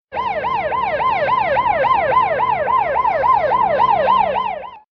Police Siren

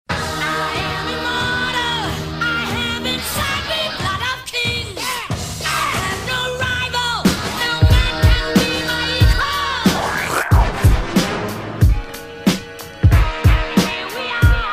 Black Beat 72

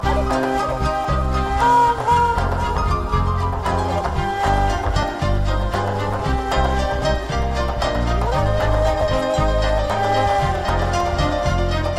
Lovely Music

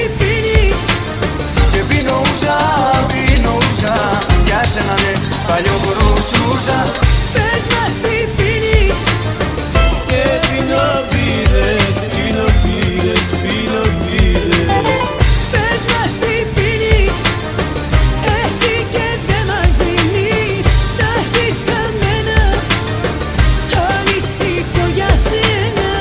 Greek Ringtone

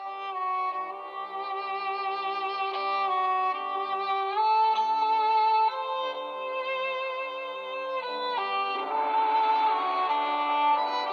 Violin; jurassic

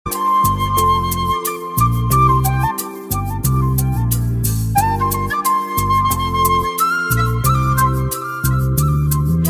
Flute

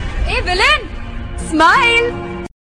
Villain smile

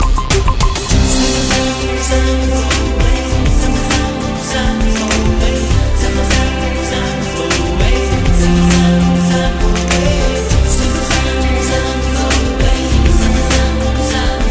Angadi Theru Rythm

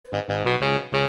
Horn

